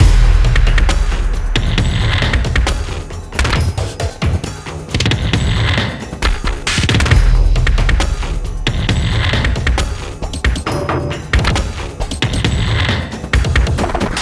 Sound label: Desert Small Fight; sky break linux macos windows gamerip 2016